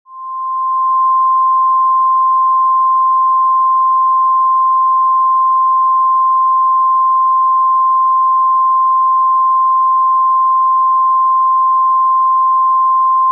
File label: MIDI mono